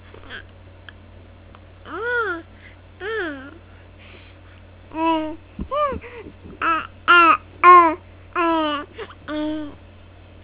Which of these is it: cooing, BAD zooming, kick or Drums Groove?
cooing